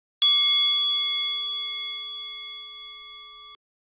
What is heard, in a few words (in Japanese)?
仏具 お鈴 チーン 1回